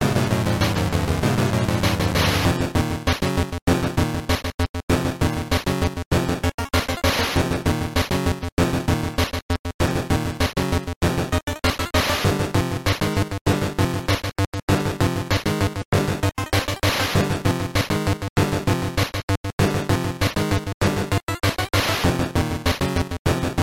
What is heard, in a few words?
Stage; Battletoads & Double Dragon